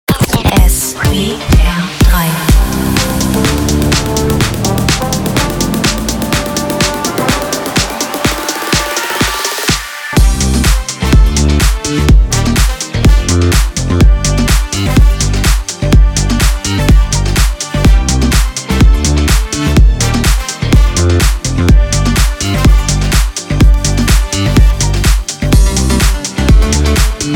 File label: Morning Opener; SWR3